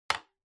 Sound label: Stapler, Put Down